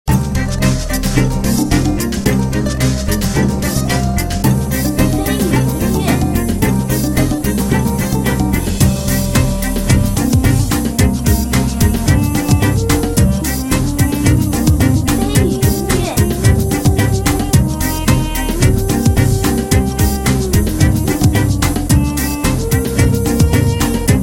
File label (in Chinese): 异域 欢快 欢乐